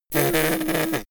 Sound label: little girl snort; soundpool